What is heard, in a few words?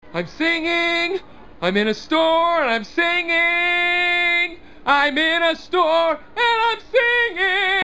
im singing